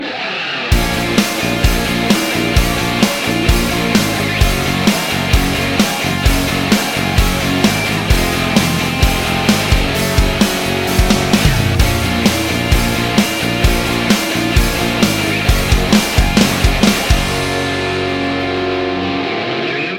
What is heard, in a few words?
hard rockin